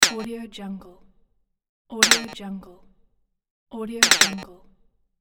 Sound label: glitch pop